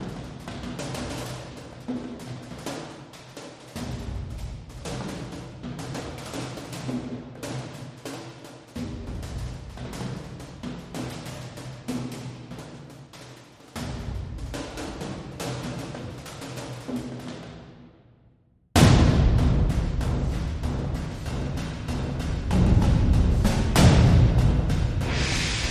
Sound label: Ominous War Drums